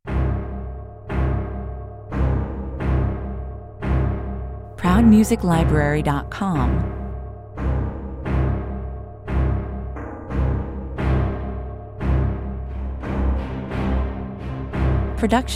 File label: battle preparation (no drums) 88 bpm